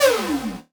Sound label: Flash Whoosh; Multimedia Internet CD-Rom Flash